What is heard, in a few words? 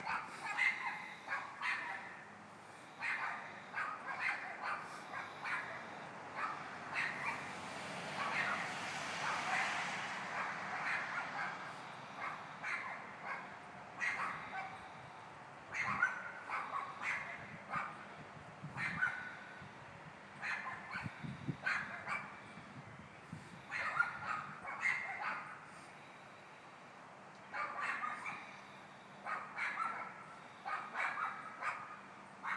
dogs next door